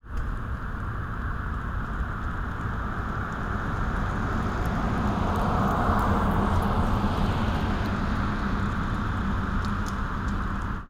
pass By forwards, EV2 Pass-By, electric vehicle with a more subtle noise-based AVAS; model Y